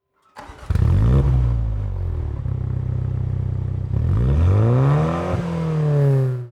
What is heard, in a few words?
Alarm Sound; MINI John Cooper Works GP 2019